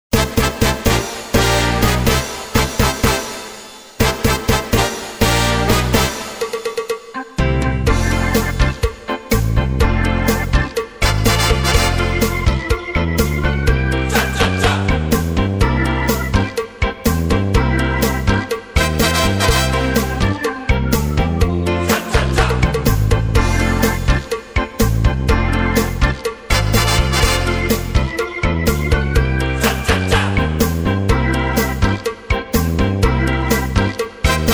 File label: Cha Cha